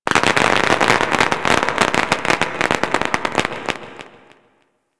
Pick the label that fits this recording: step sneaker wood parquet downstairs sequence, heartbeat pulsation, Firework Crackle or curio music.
Firework Crackle